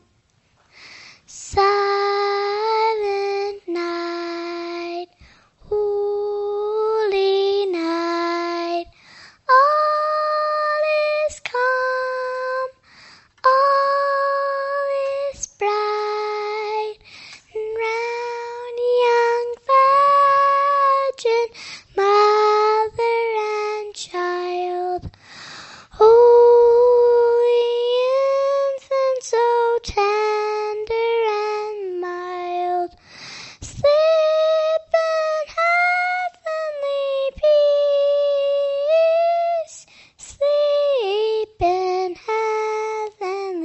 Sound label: Choir Congregation Silent Night